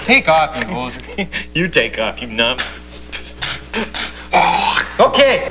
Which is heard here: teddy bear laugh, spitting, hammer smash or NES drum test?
spitting